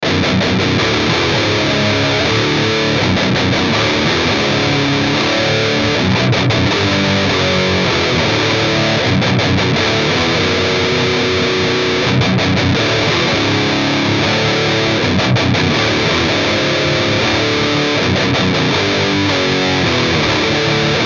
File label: Heavy Guitar Tone